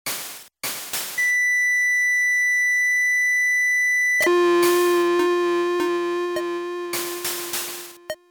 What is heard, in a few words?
bleep out